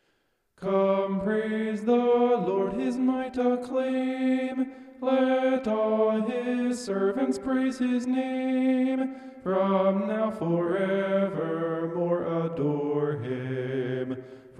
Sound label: vocal homoph; psalm 113